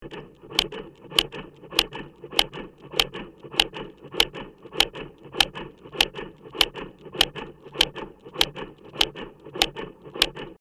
Heart Simulator; On, Run Steady In / Out Breathing With Light Bellow Inflate / Deflate; heartbeats